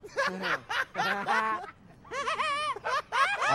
risa jajjja

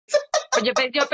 risa

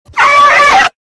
perro gritando

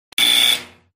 timbre; timbre escolar

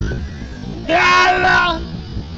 lala lento

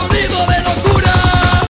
sonido de locura

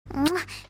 muach

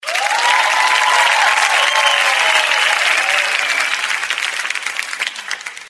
palmas bravooooo

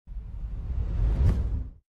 una transicion fx

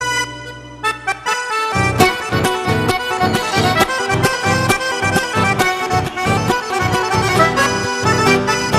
milonga

